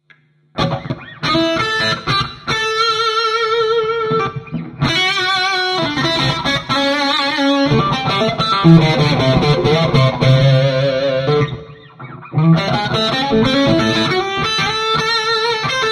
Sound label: blues